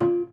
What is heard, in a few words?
Prepared Piano